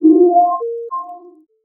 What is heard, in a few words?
Multimedia Internet CD-Rom Flash Hi-Tech